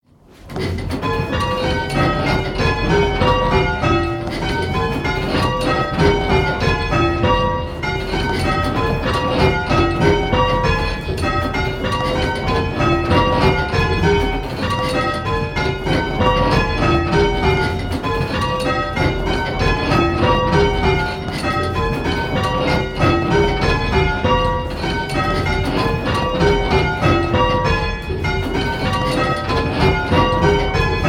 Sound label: Dorian Grandsire, bells, Grandsire Doubles